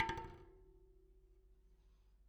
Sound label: Spoke Bounce, Bicycle